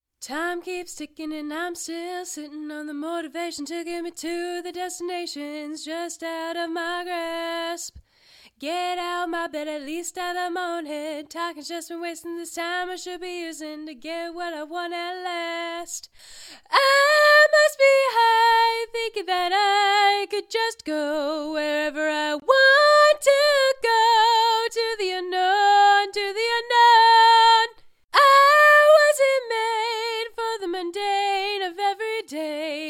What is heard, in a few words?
Pop Country 90 Dm